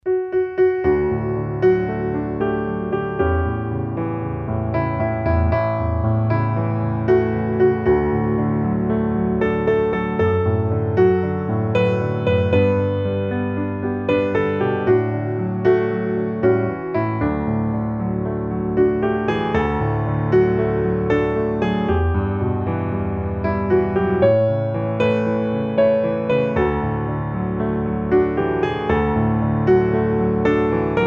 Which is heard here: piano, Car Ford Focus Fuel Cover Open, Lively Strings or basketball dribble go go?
piano